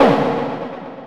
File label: hit, sfx; anvil